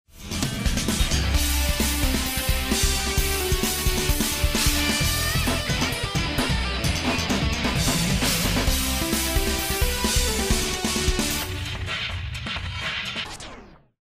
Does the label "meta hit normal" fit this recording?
No